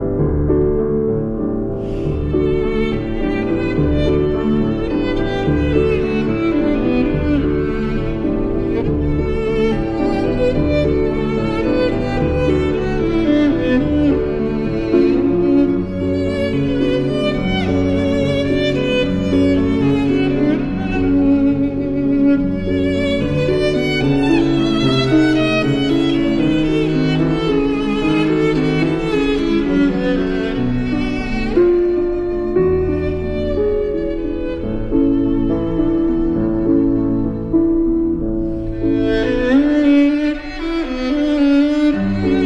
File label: Violin and Piano in D; Berceuse for Violin and Piano in D, Opus 16